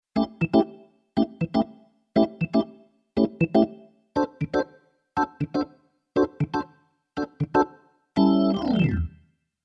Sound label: Keyboard Organ Funk Organ